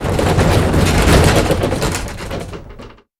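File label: helicopter blackhawk stereo rattles; Explosions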